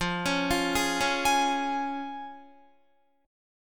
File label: F Minor Sharp 5th, Guitar, chord; arpeggiated